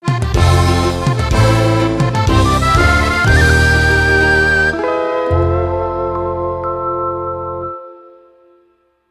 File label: Music Victory